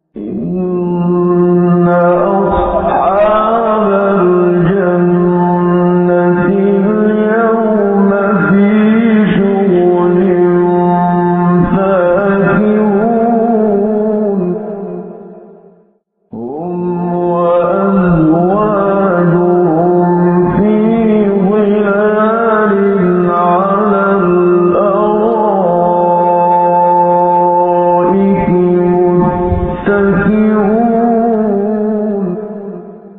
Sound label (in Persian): فرازی محزون از تلاوت سوره یس; استاد منشاوی